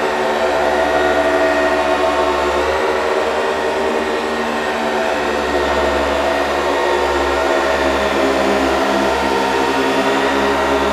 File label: giant fan scifi turbine; Titanfall 2